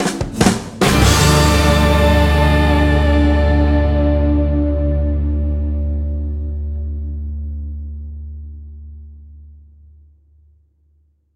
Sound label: Sting Victory